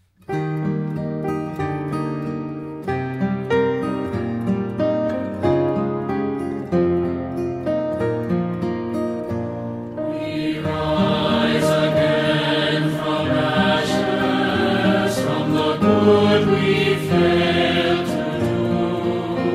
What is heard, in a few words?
choir; lent music